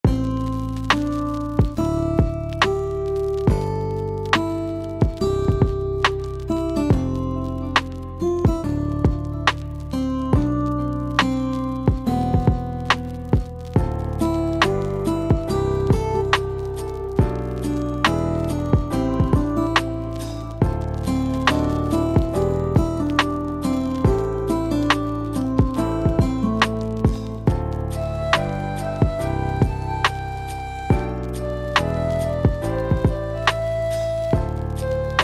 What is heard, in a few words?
bonfire, 【フリーBGM】しんみり、焚火(ゲーム用・ループ仕様)